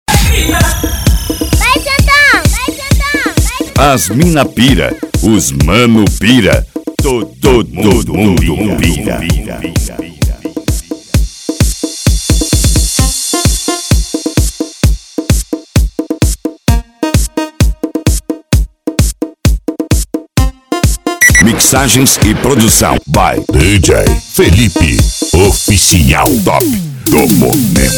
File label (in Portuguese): eletro funk pancadao